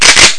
reload

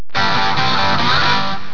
WIN

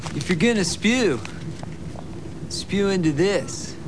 SPEW

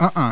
uh uh

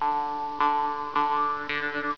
lev end

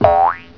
boing

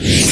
FLAME

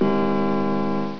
CLOCK

